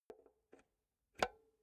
insert xlr multi socket slow, elektronik; objekte

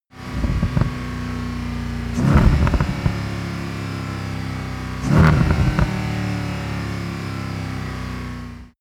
car mercedes sls amg deceleration short rev up

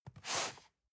cardboard box medium slide short; objekte

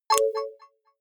notification signal

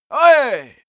male attack, schreie

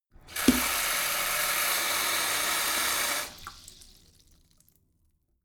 sink fill splatter hard short; haus-garten; badezimmer

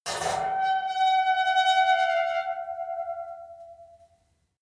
metal squeal saturated; industrie-wirtschaft

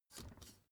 step ladder wood grip single; schritte

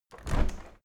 window handle open; objekte; fenster

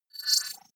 wobble swell constant short; sweeps-swells

